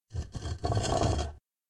Deep Rumble Walls; SyrinScape; Hunting horror battle

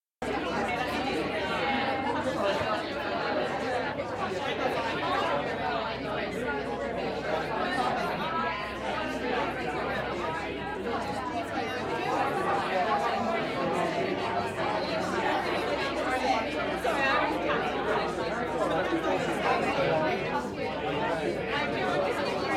Tavern Loop High frequencies; SyrinScape

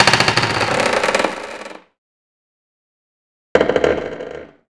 dock CREAK; The Elder Scrolls III - Morrowind; Morrowind GOTY GOG

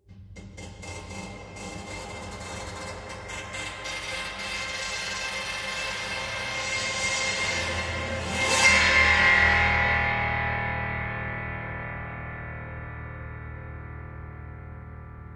piano notes; SyrinScape; Madness in the library

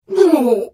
Hit; The Elder Scrolls III - Morrowind

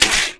roar; The Elder Scrolls III - Morrowind

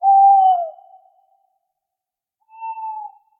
Tawny Owl; SyrinScape

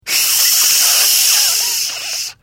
Hit, Vo; The Elder Scrolls III - Morrowind; Morrowind GOTY GOG

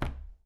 heavy drop; Papers Please

